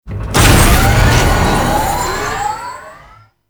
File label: Elevator Speed Up; Warframe; Jade Shadows; Ascension